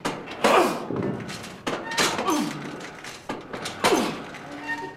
Gym Machines and Weight Ambience, Some Grunts

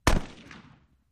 .50 Caliber: Single Shots

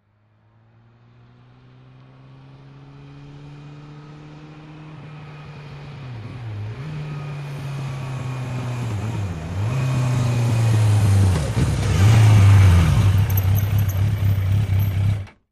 Motorcycle Road Racing

